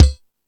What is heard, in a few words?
Lotsa Kicks, Drum Machines